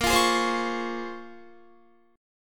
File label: Bb Minor 9th Bb, Guitar; Bbm9 strummed